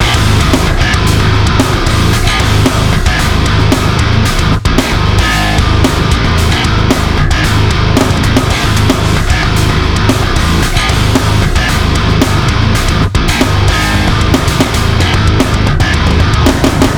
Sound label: full drum kit; df xcite; drumforge